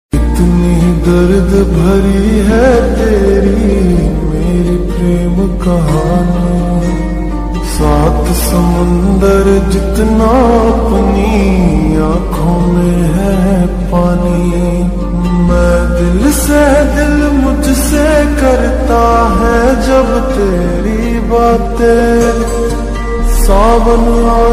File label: hindi sad ringtones mood off ringtones sad love